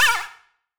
Creature small; Free SFX for anything

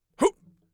hup, Mage Voice Pack

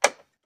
switch

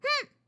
swing, fighting

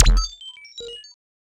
Computer Beep; FREE SFX PACK VOL 3

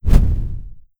explosion; NIIIEMAND's explosion sfx

